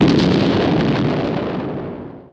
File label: Explode; EMERGNCY; Emergency Demo